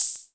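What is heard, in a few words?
OHH, open hi-hat; X-Static Goldmine 1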